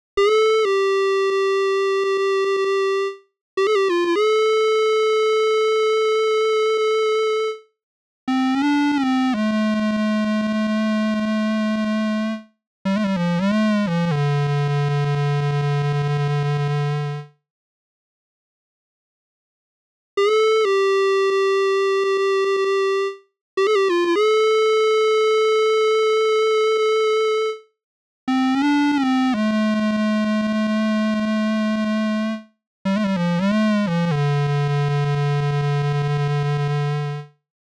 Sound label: hollow square